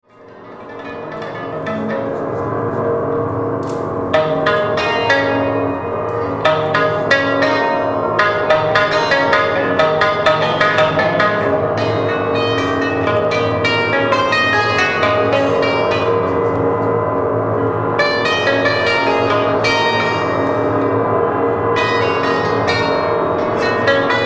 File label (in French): musique action